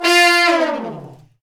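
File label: Brass Fat Falls; Roland L-CD702; Fat Section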